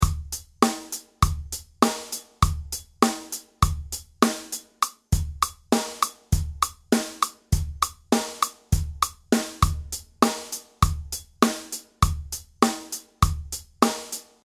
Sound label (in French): illusion rythmique avec click 100bpm